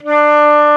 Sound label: FLT FL D4, Flutes; Roland LCDP04; 90 s Sample CDs; C Flutes 3-8; C Flt Sh 6&7